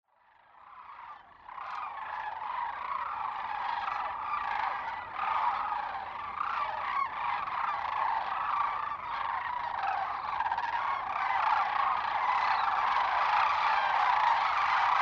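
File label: sounds of crane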